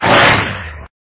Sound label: dragon attack